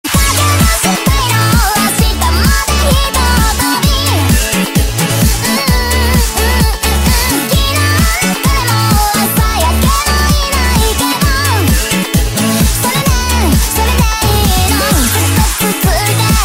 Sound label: Drop pop candy